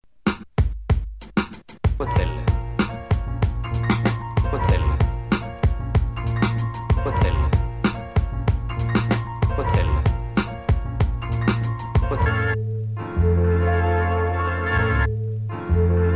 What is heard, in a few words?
keyboard concerto